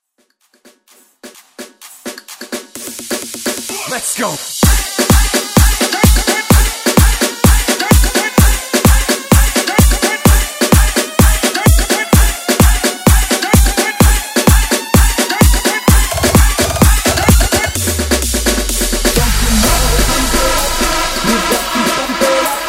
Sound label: Moombah